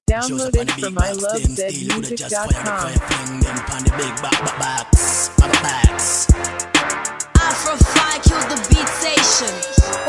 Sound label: Beat